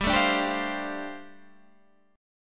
G 7th Suspended 2nd Suspended 4th G, Guitar Standard; G7sus2sus4; strummed; 15,12,12,12,13,13; down; capo=3